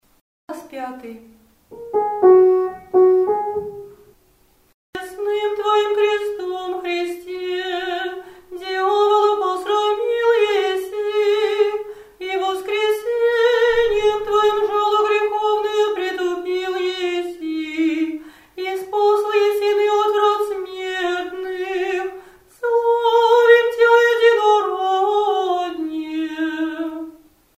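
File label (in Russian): glas 5, stichera, church singing; Stixir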